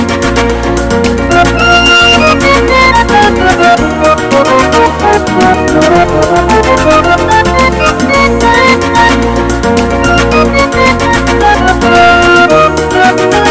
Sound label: Modern and Happy Salsa